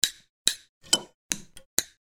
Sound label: wire cutting